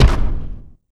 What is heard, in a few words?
step; Quake 4 Alpha; id software; strogg; tik